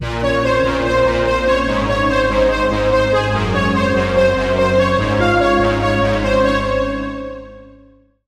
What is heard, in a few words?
Lead, Serum 2; Routed Sound; Serum 2 Expansion - Reality Maker Vol.1